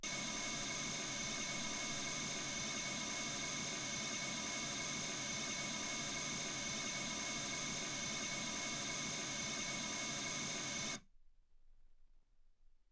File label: mls16 48kHz